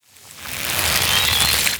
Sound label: node hit rise; xen